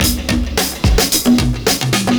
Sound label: PERCS, 70 s Breakbeats